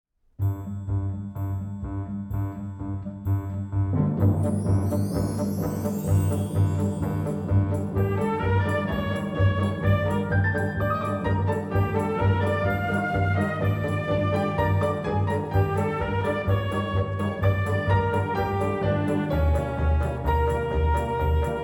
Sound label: Orchestral Fantasy